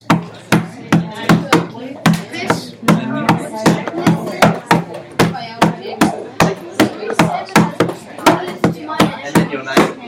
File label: Music Sample ostinato